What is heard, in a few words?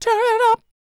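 falsetto, R&B Dance; Spectrasonics Vocal Planet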